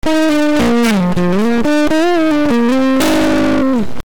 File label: heavy distortion